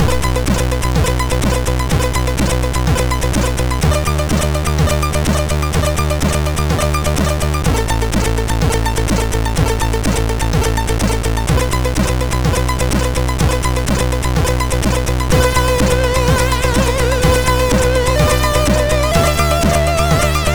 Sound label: Digital Zakx tune E, sid MOS6581R3